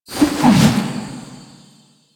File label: Glyph Ball Throw; Warframe; Duviri; Glyph Puzzle